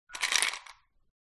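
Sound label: Ice in Glass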